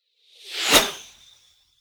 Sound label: bow and arrow flyby air